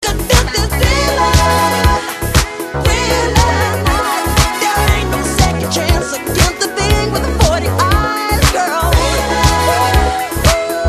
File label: win